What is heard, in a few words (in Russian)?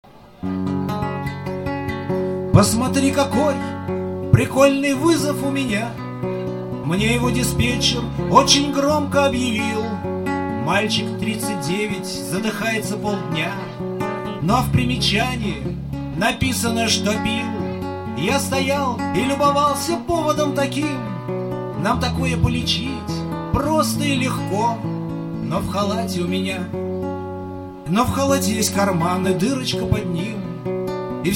Песня, песни скорой помощи